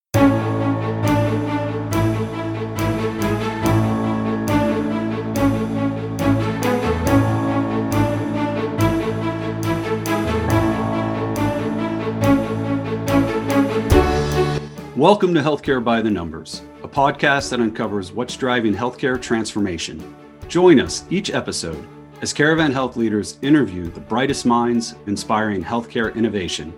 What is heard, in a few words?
intro With Music; Health Care by the Numbers